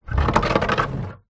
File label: household wheelie bin small movement on concrete ground